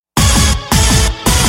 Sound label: Noise; Soul Eater